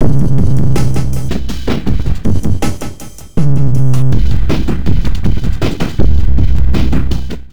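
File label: neuro, drum loops crazy processed, 80 bpm; USB Soundscan vol.07; AKAI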